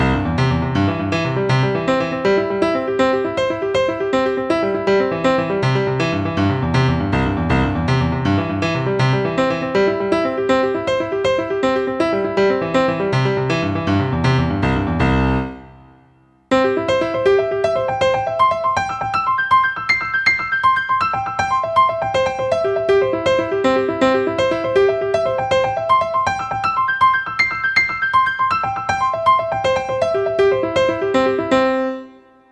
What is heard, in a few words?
Overlapping Arpeggiation in Triplets